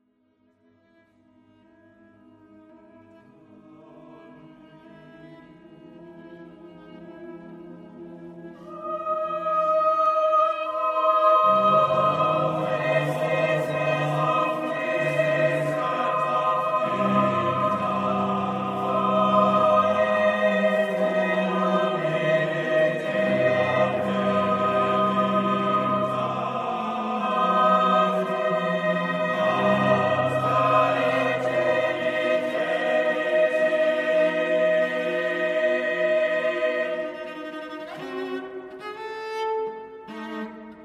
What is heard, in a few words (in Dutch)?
Stabat Mater cello en koor; Knut Nystedt